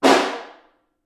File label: Far, Snare